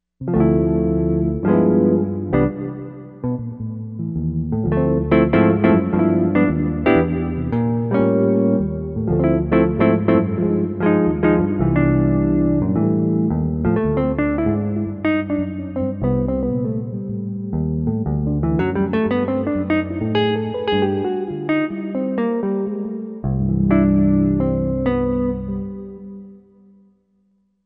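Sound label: Jazz Guitar Hall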